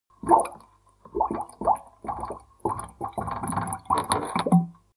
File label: flowing submerged small burbling gutter